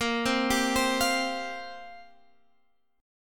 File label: Bb 6th Suspended 2nd Bb; Bb6sus2 arpeggiated